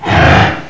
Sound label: pain, monsters, face; svencoop; AoMDC